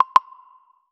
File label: soft gentle wooden ui; minimalist